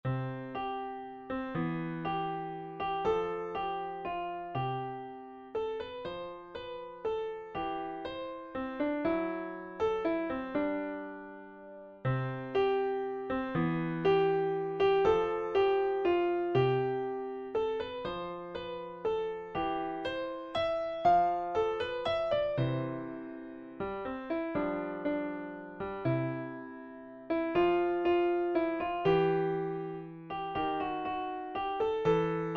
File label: Waltz, Piano